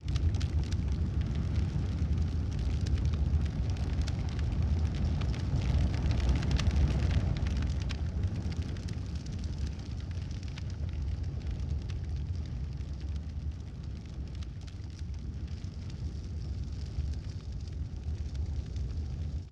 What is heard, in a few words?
Bonfire Sizzle